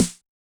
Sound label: Yamaha DJX 2B, SD T9 L